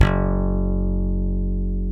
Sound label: E Bass Slap